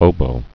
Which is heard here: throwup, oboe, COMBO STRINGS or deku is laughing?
oboe